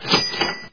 cash, till

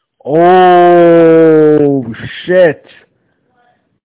ohhhh

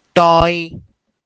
toy

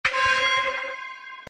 dong

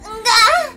guh

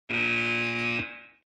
buzzer, chicharra

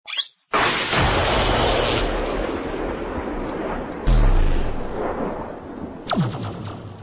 boom

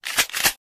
gun loady

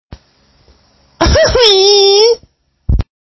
game sound

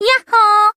yahooooooooooooooo

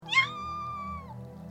squeak yaa

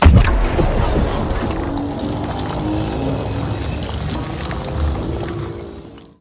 charging up; cryoclasm

